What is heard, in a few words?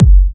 Electro Kicks